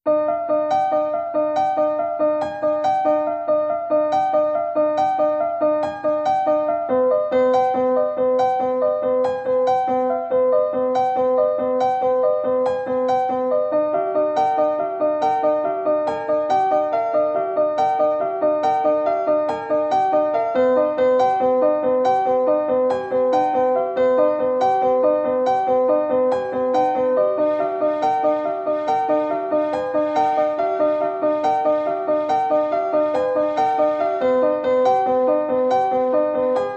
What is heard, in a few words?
Piano Mix